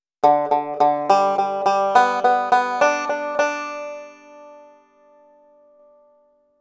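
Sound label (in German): alternate picking triolen, banjo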